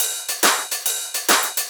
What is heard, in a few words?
Shuffled Loops, 140 BPM